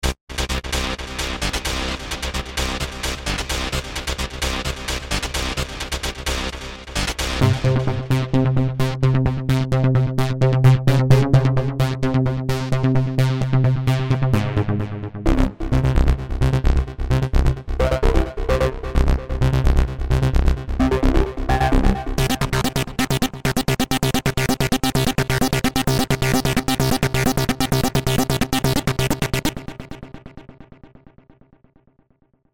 techs

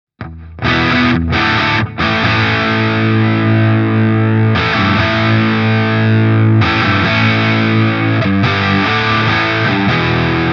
Z.Vex Sound of 59 Vertical Gain max. Tone 12 h

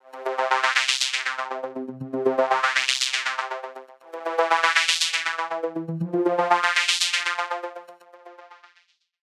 Preset Plucky Movement

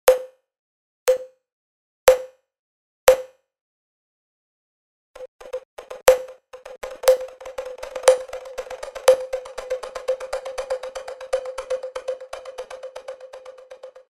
Cubase Pro 10.5 Multi Tap Delay

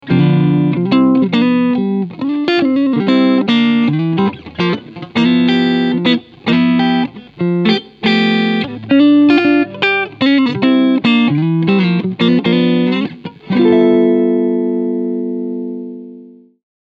Clean Front Singlecoil

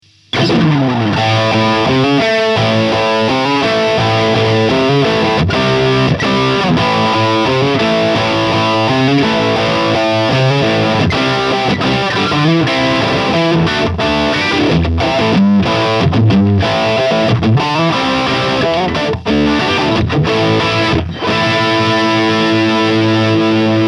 High Gain Stack

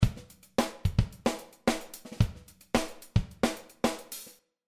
Drums Dark Room; Rumour

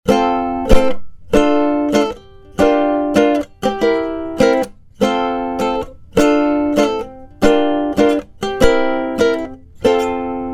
ukulele bass; IMG Stageline ECMS 90

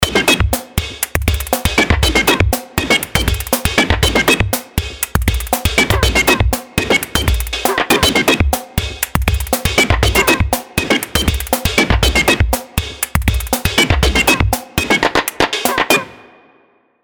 DrumComputer

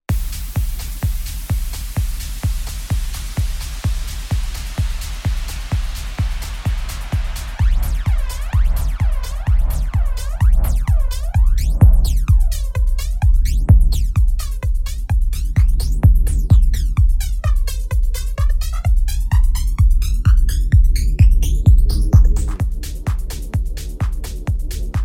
RMX 60 Flanger

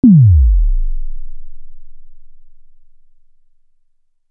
filter sine opening

